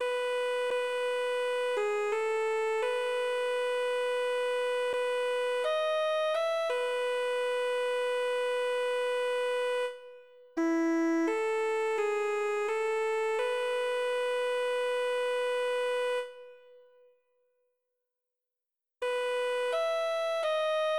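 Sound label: Soprano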